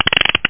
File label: slime hit; tritus